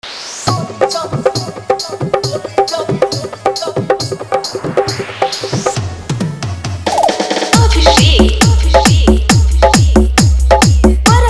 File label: Hard Vibration Mix